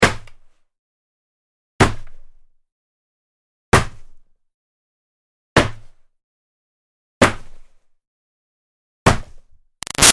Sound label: Hockey Hits Body Check Helmet; Sports